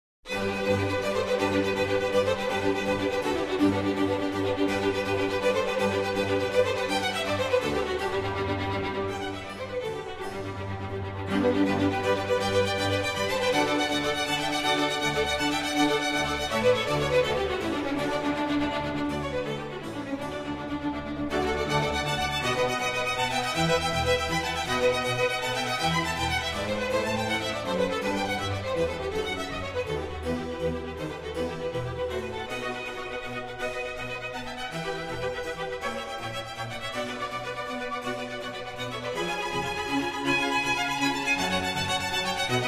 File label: Concerto for Strings and Continuo in G major